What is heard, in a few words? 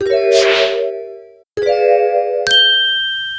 cuddle; roly